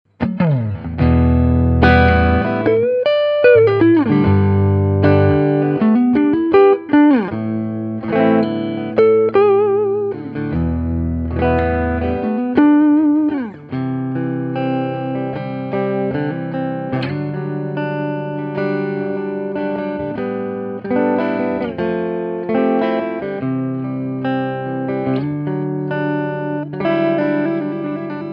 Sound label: little five jazzmaster